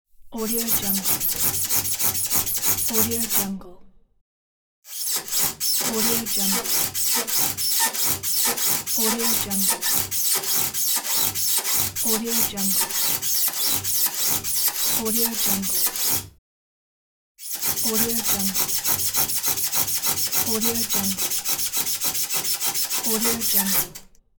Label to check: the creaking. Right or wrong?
Right